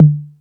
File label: Beat Box, E-MU Pro-Cussion